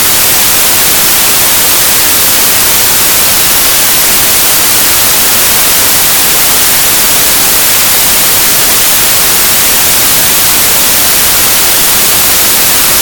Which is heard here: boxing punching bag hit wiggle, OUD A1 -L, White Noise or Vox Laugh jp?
White Noise